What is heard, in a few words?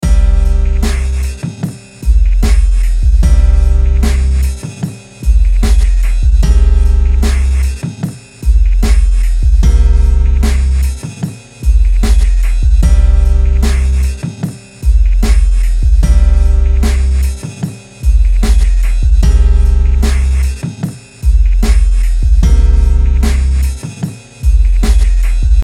hiphop beat